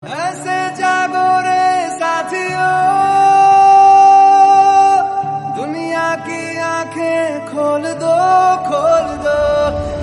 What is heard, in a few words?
Hindi Song